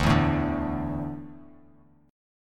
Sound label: D Suspended 2nd D down; strummed